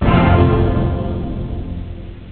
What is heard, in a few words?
level sound; Quasar Phase II